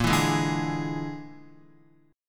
Bb 7th Flat 5th Bb, Guitar; Bb7b5; strummed; JGuitar

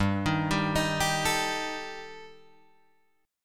G Suspended 2nd Sharp 5th, Guitar Drop D

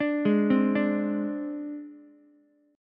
Ab Major Flat 5th Ab Ukulele; AbMb5 arpeggiated; JGuitar

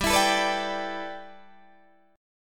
G 6th Add 9th, Guitar, Open D

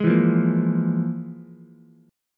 F Minor Major 7th Double Flat 5th, Ukulele; FmM7bb5 strummed; JGuitar

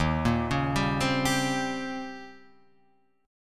D sharp 13th D sharp

